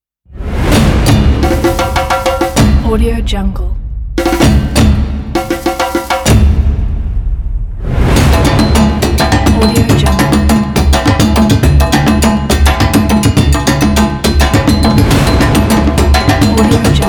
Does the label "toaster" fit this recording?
No